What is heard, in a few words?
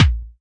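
Pearl SC-40 bass drum; DRUM MACHINES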